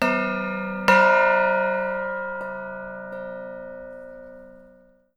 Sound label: gong